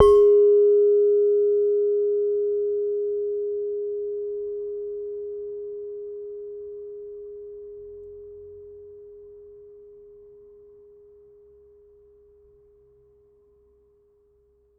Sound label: Saron D, Gamelan